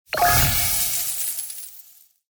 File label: win Last; 1casino-first-cubes